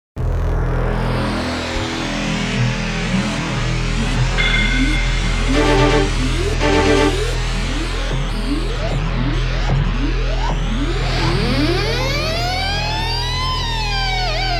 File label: tension; Initial D Sound Files Vol. 1